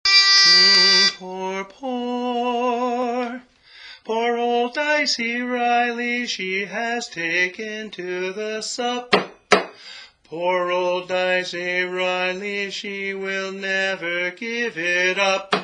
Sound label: tenor; Dicey Reilly